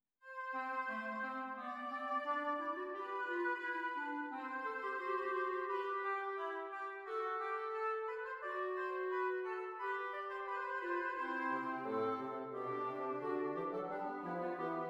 Fuga in fa maggiore doppelfuge; Hess 243; prima versione; Nottebohm

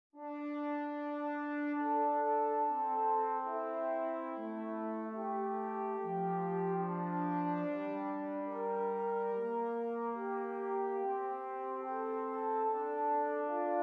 Esercizi di contrappunto a due voci; viertes Gattung; Ronge pagina 60; variante

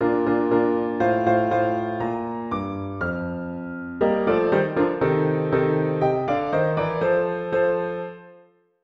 Appunto per pianoforte; Versione Graziano Denini

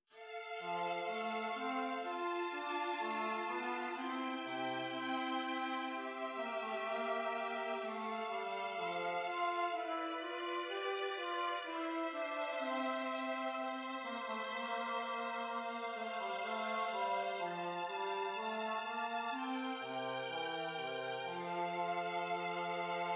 esercizi di contrappunto semplice A tre voci

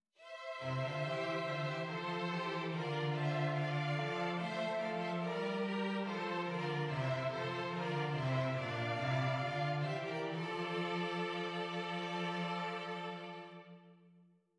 esercizi di doppio contrappunto nella decima a quattro

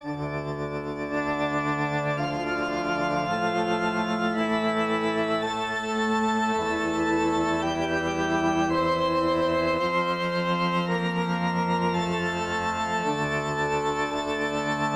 Esercizi di contrappunto a due voci; zur Viertes Gattung; Ronge pagina 66

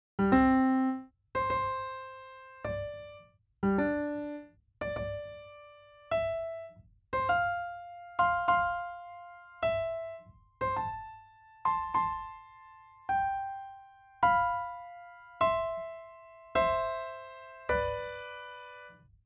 Sinfonia in do maggiore; Fase Preliminare I Tentativo

